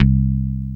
PC-BASS HI

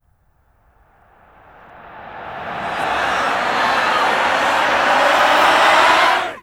SHOUT REV; LAUGH

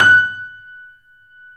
3D Pianos, F#

